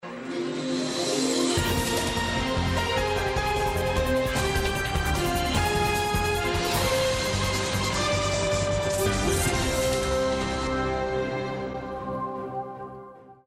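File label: modern news intro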